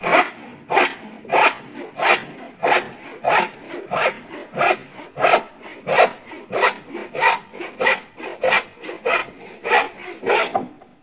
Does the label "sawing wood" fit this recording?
Yes